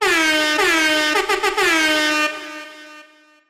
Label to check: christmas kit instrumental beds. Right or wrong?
Wrong